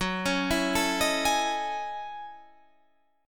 F sharp Minor 13th; arpeggiated; JGuitar

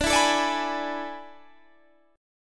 A Major 7th Suspended 2nd Suspended 4th

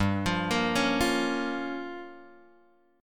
G Minor 7th Flat 5th, Guitar Open G

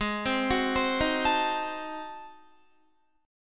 G sharp 7th Flat 9th G sharp down Guitar, chord; G#7b9; arpeggiated

